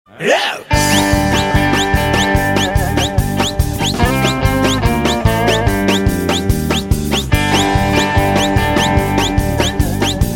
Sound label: whistling guitar